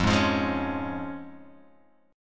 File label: F Minor Sharp 5th, Guitar Open G, chord